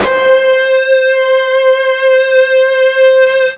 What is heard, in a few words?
FUZZ C 4, ULTRA FUZZ, Guitar; East West; Steve Stevens Guitar Samples Collection